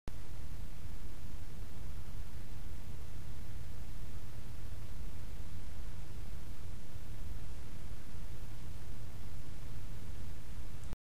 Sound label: Arctic Cooling F12 PWM 7V